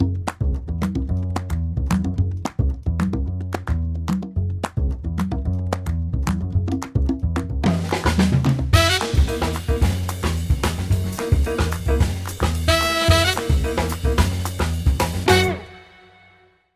Cultivating Jazz Time

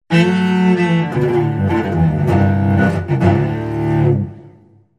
Poor Folks Cello

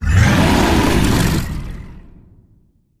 Bigfoot Titan Roar

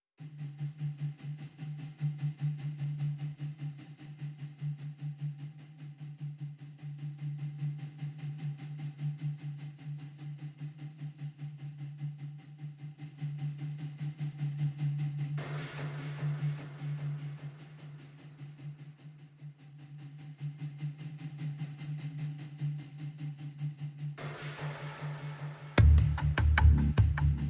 Pads and Pulses

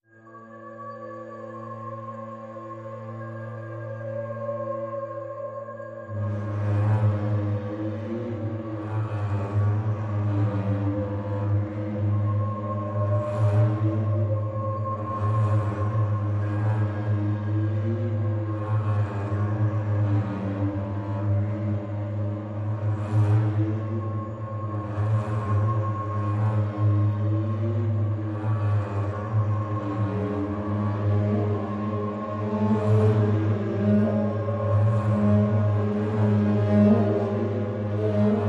Atmo Reflections Only

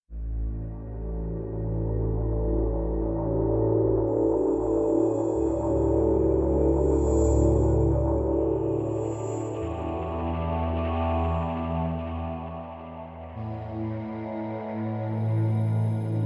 Drone, Light

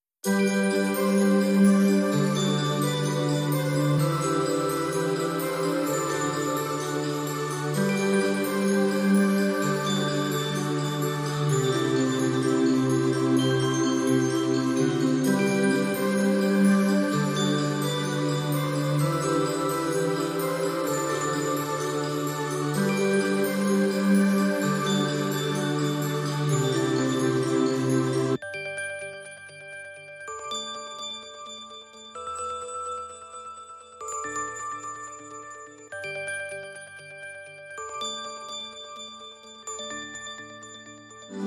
Synth Only

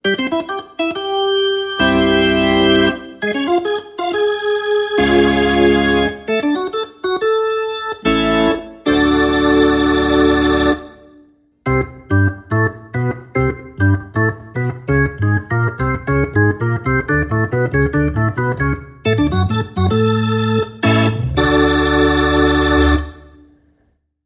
Ballpark Charge

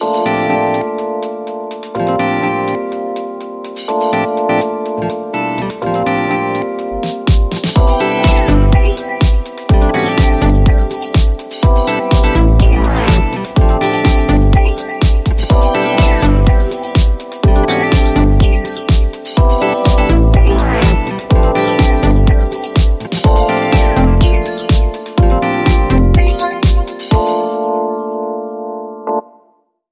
Electro Swing

